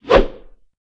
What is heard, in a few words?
Sword Attack swoosh; Fishmen